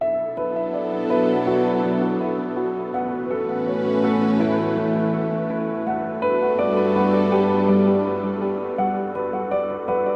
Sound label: Documentary Chill Ambient